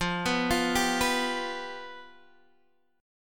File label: F Suspended 2nd Flat 5th, down, Guitar, Drop D